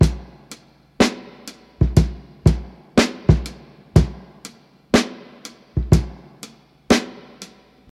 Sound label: 61 bpm rap drum loop sample b key